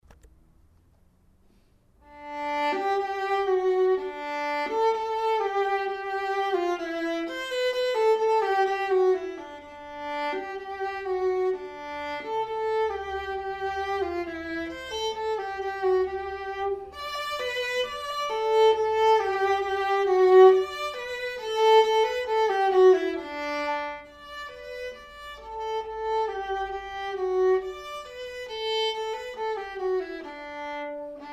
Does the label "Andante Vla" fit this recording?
Yes